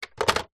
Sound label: leisure toy gun rifle plastic set down on wood floor